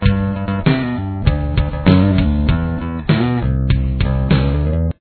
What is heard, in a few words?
main bass